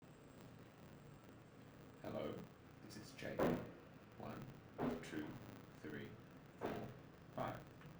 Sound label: denoised mono 48k; serial audio catcher